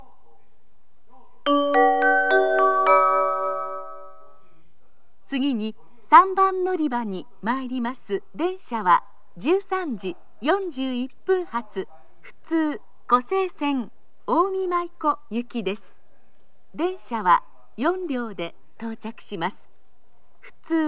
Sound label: local, kyoto station; omi-maiko; jr-west